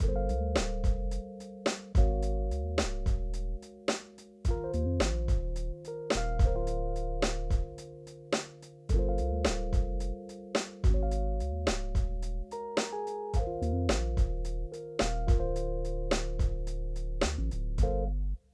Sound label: Repetitive Bassline